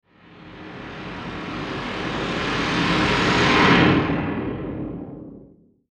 Ascend Dark Reverb Swell; Blastwave

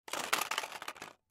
Flashlight Roll Cement Plastic; Electronics; Foley; Blastwave

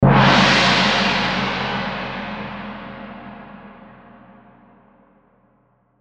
Gong Cymbal Crash; Cymbal Sound Effects; Blastwave

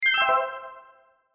Organ Arpeggio Descend High; Cartoon; Musical; Blastwave

